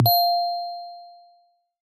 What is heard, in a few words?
notification